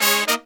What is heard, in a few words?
Horns, 110BPM; 90 s Sample CDs; Zero-G; Phantom Horns